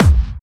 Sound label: BD Clubby; VEC1